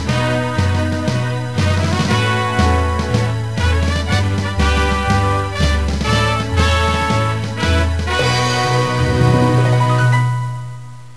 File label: victory; El-Ixir™ 3.0.3